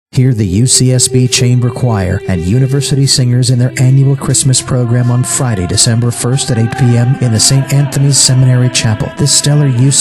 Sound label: choir